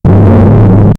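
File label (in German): Brummen wie von einer Rakete, rocket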